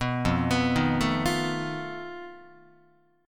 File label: F 7th Flat 5th F down Guitar; F7b5; arpeggiated